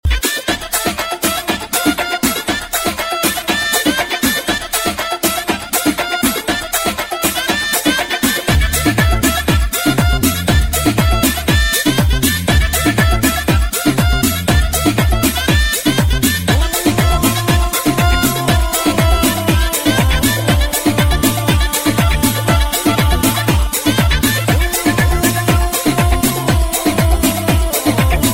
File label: music ringtone; bollywood ringtones